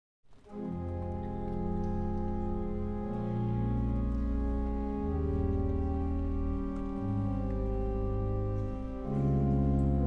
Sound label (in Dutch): orgelkoraal psalm 4 met aansluitend samenzang vers 4; Psalmzang vanuit Rotterdam